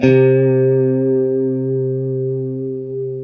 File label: C JC120; Spectrasonics; Hans Zimmer Guitars VOL-1; JC-STRAT MF